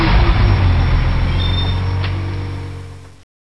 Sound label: truck stop; dmc